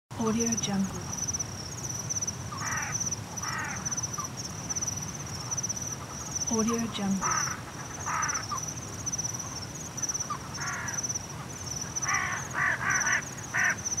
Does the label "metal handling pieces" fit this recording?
No